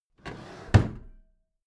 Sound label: packed drawer smooth clatter close regular halfway